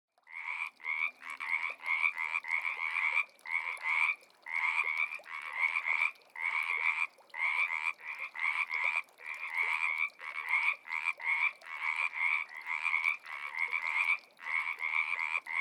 FROGS

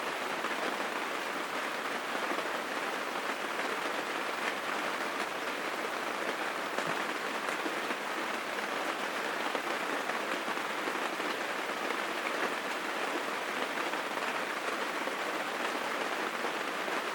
RAIN UNDER A TENT

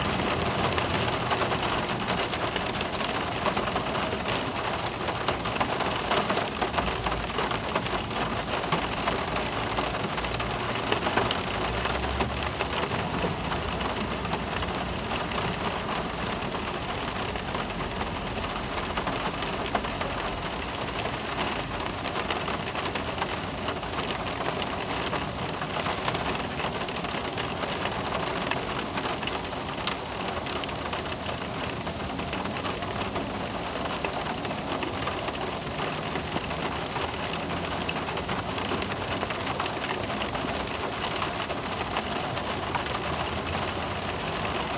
LIGHT RAIN IN A CAR

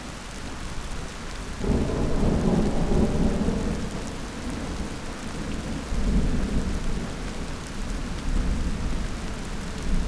THUNDERSTORM

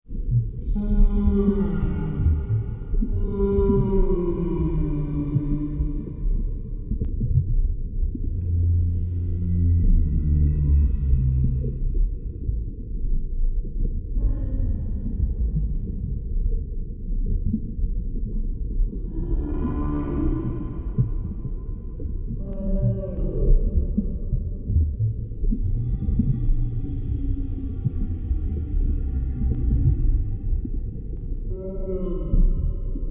WHALES

